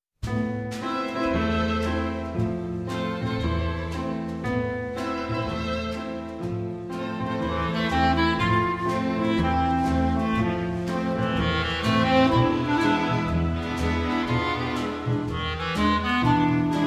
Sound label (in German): Klarinettensolo